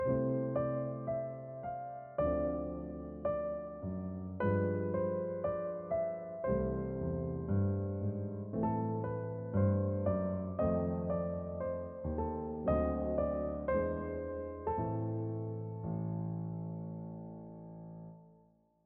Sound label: Blues Duet